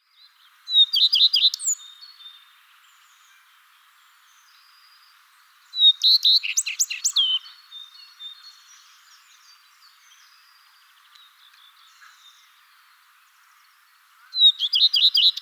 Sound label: Phoenicurus phoenicurus